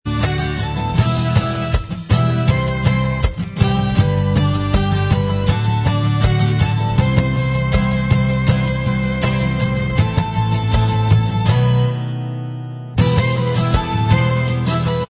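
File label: acoustic version instrumental track, backing tracks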